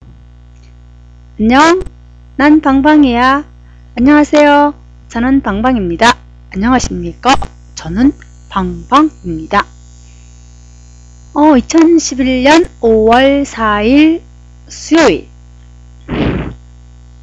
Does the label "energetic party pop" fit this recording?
No